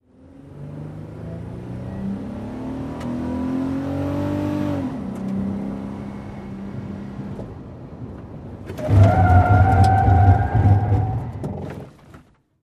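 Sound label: SUV Truck Accel Skid Interior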